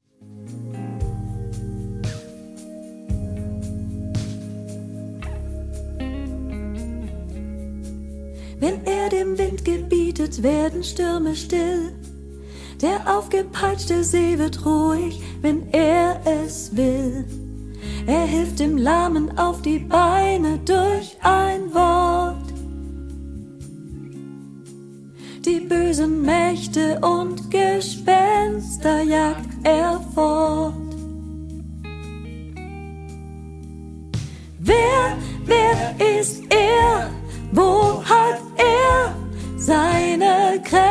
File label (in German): Lern Sopran